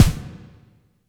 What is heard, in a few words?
snare, Real